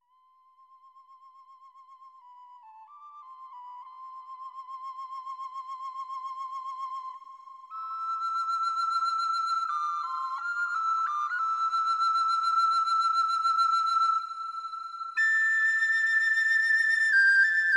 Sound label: First flute; Three Colors - Blue